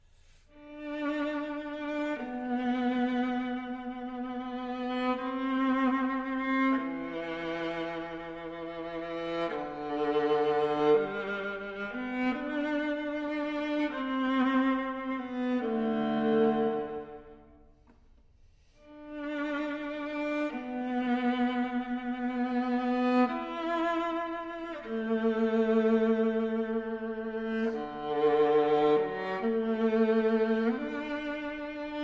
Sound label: viola Sound Sample; Model 1